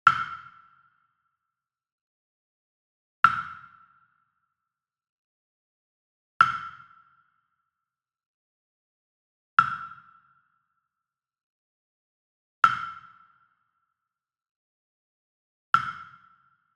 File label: Wood Percussion Wood Block Standard Resonant Hit Medium; boom library; Cinematic Strikes Construction Kit